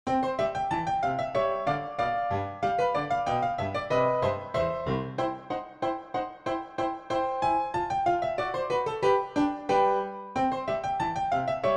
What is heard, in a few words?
Dance PianoTeq Bechstein; Dittersdorf 20 English Dances Schott